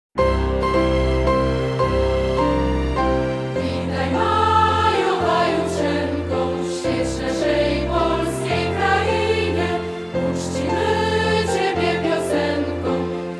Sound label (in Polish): Mazurek Trzeciego Maja